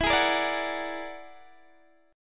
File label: E 9th Flat 5th E down Guitar Open D